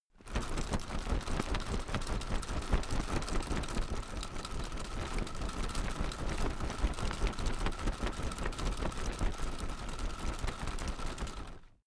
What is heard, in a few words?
umbrella telescopic shake fast soft